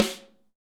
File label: snare dry; Real Mega Drums